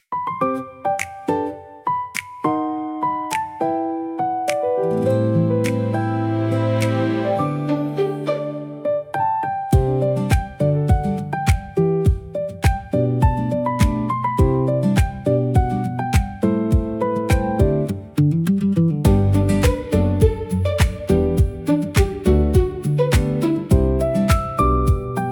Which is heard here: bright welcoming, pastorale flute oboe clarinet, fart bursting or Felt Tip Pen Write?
bright welcoming